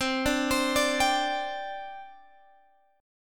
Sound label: C Suspended 2nd C Guitar Open G